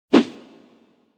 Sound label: transition